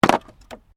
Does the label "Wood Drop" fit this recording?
Yes